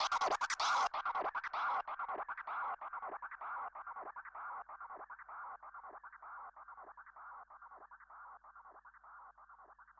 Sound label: FX, viral hiphop